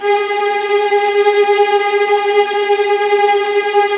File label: VIOLINT, Synclavier Strings; ILIO